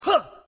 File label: male sound; umk3